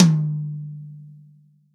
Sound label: REAL DRUMS POP KIT